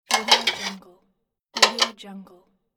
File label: dishes